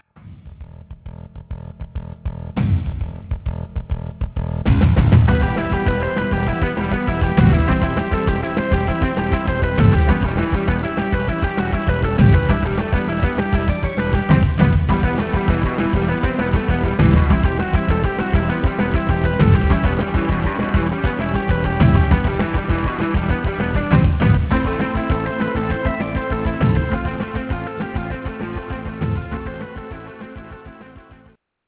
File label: running